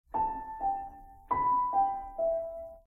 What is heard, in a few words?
Piano De Noise